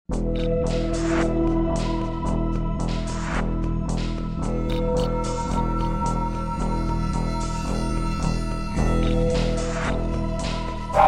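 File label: criminal music without words for background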